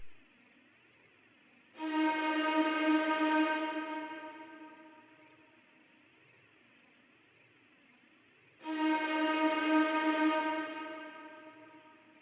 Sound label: 140 BPM D# Min String; Melodies; Dark; Loop Stems; Cymatics; Cymatics - Monarch Melodies (Teaser); Reaper